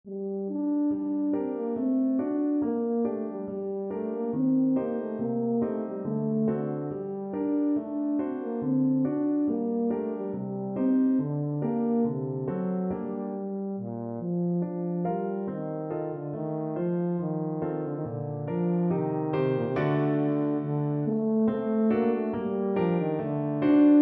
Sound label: gavotte, tenor horn